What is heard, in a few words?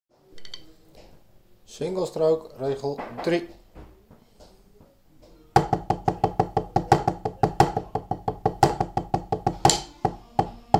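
Single stroke roll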